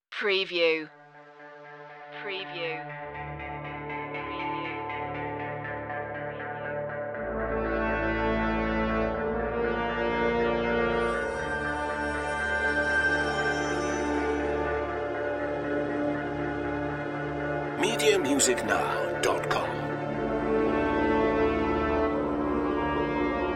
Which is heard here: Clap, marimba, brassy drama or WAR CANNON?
brassy drama